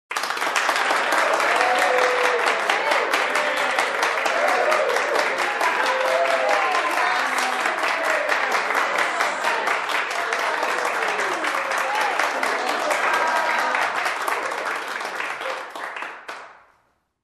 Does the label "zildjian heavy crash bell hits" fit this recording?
No